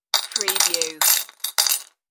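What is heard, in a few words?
Multiple Coins Bowl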